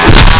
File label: chain hit body